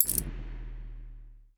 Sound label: inventory item close; ui